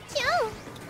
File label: kyan